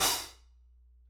Soft Xtra Dark Long, Hats; Airwindows

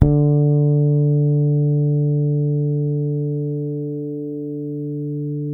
HARMONIC D, PEDULLA BASS; Masterbits CLIMAX 7 - Bass

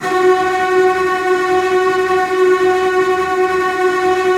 Virtuoso Strings, TRM FX

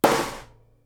SNARE, Live Snares

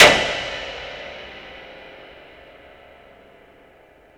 KLAVS; Optical Media International; Sonic Images Library; SI FX Vol 6

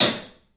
club hat; 3D Sound; 3D Drums

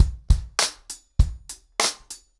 100BPM, ACOUSTIC DRUMS; The Roots Drumloops

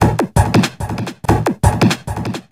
DRM, BACKGROUNDLP; Best Service ProSamples vol.49 - FX-Area; AKAI

